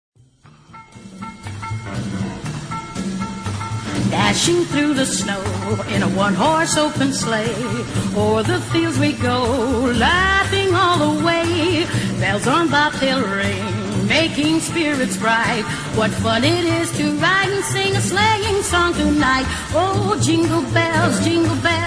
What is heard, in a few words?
jingle bells